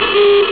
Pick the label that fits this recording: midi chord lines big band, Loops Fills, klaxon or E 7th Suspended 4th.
klaxon